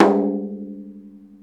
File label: MalaiyuDrm; Club-50 - Foundations Roland; Exotic Drms